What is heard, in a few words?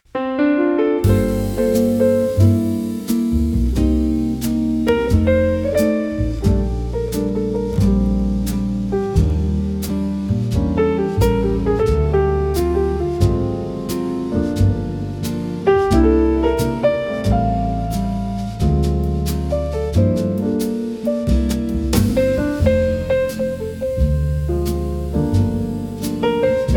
bossa nova acoustic for bright afternoon